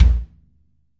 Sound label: yam kick, Real Kit; REAL LIVE KITS; Multisampled Real Kit BJ-HD9